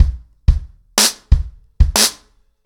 90BPM, acoustic drums, drumloops

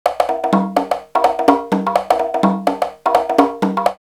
Bon Gos 126, drum loops, drum machines; X-Static Goldmine

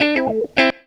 GTR, GTR RIFFS, Funky Elements; Zero-G

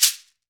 Latin Shakers, shekere

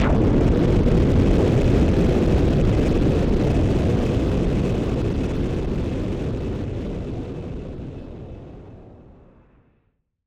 Noise Bomb Boom Filter; musicradar; bomb factory

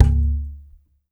WD, DRM HITS; Spectrasonics; Liquid Grooves

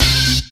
SYN, dance; Sound & Vision - Gigapack II

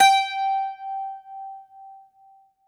Greek Bazouki, plucked strings; E-MU Producer Series Vol. 7 – Old World Instruments

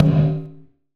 Perc, Gated Reverb; musicradar

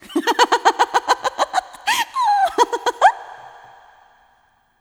laugh

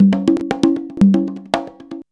CONGA 119

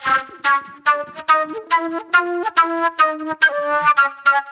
Agro Fusion Flute

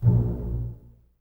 BSS A#1 Pizz, String Ensembles Basses; Miroslav Vitous

